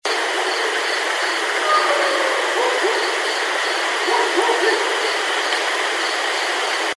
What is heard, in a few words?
voice, bigfoot